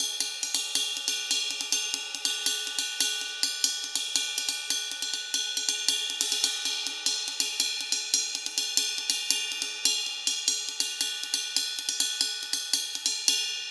Ride 140 bpm, Drums; Loops; Top; Ableton Live 12 Lite; Core Library

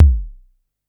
Tom Low, Drums; One Shots

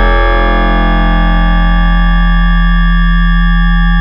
Harmonics Crystal Shifter; Ableton Live 12 Lite; Vector; Sprites

